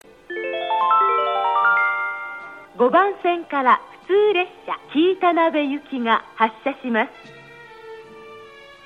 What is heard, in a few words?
wakayama track start bell; track 5; for kiitanabe; nishinihon